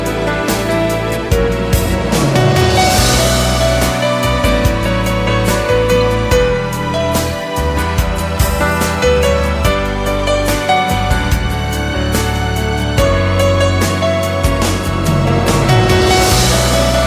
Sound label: Autumn Melody